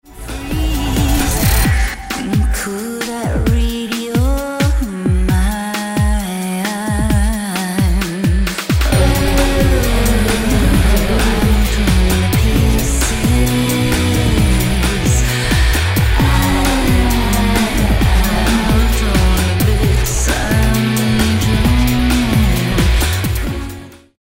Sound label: shooting laser beams